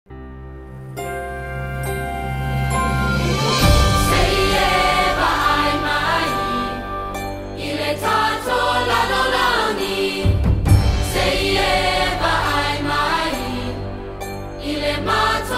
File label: VOCAL Track; Moana Jr